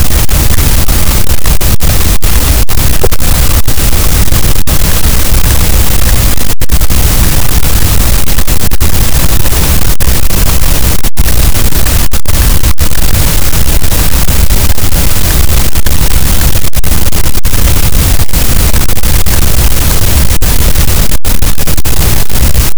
cachalot, Physeter Macrocephalus, gaussian noise

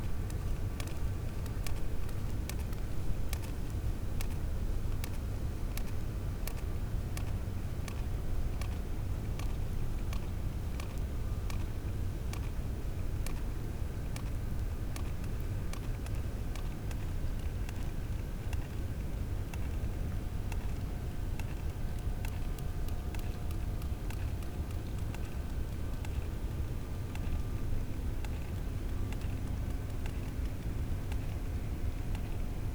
cachalot positif, Physeter Macrocephalus

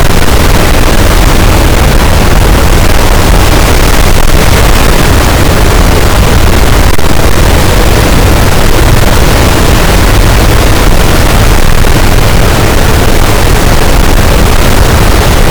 cachalot, boat; Physeter Macrocephalus

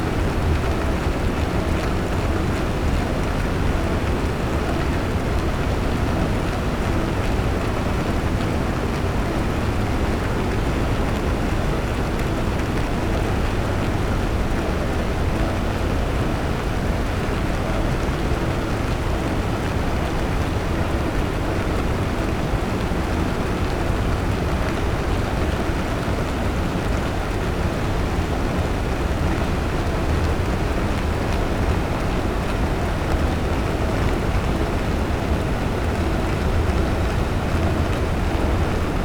cachalot negatif, Physeter Macrocephalus

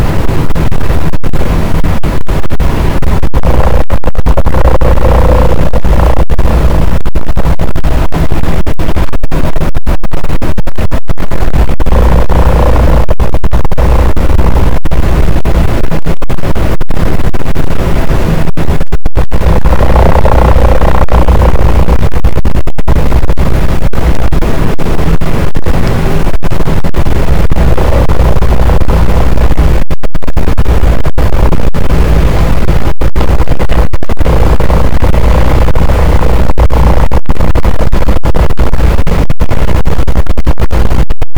cachalot, boat; Physeter Macrocephalus

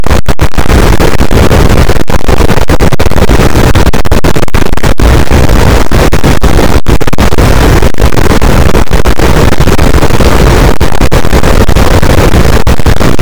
cachalot negatif, boat, Physeter Macrocephalus

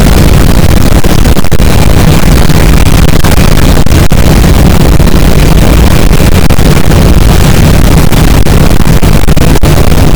cachalot negatif, boat, Physeter Macrocephalus

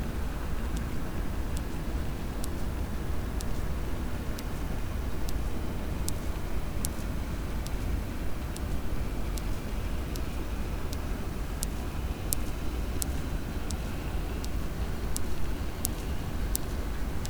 cachalot positif, Physeter Macrocephalus

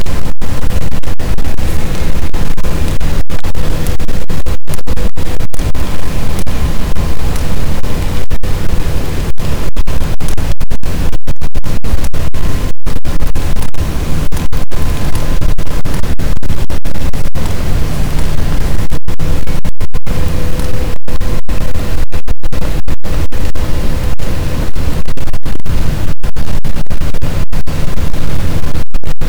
cachalot positif, boat, Physeter Macrocephalus

